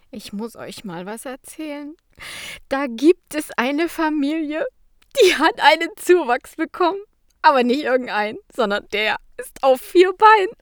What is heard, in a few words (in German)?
lachen